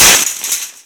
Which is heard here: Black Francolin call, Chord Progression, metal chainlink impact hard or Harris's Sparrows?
metal chainlink impact hard